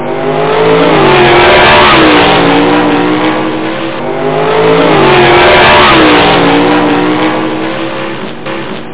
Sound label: motorbiking